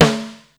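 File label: snare hip, Yamaha Motif ES drum machine; 300 Drum Machines; Yamaha Motif ES-Series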